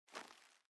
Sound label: jump generic; out of reach treasure royale 2020 gamerip pc